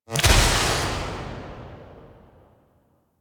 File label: Impact; Warframe; Tenno; Grimoire